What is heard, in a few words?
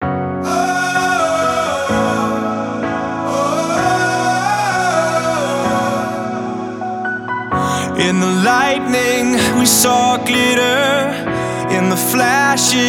Acoustic Mix